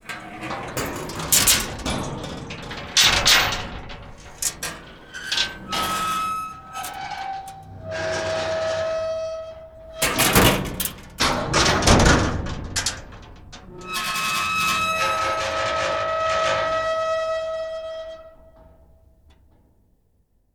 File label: Donation Bin Door; Big Room Sound